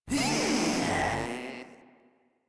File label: Proto Infested Runner Player Spotted; Warframe